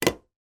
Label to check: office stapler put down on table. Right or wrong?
Right